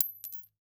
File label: Foley Coin Dime Dropping On Cement